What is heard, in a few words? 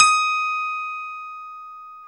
KEY MKS, Pop Pianos, Rhodes; Roland L-CD701; Pop Pianos 3